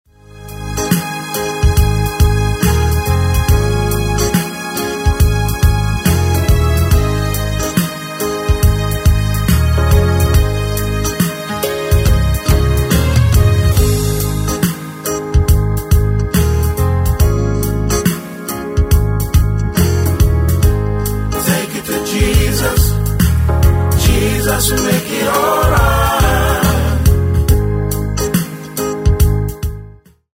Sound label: Instrumental With Background